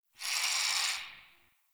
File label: Tree Critters Angry; Warframe; Duviri; Ambience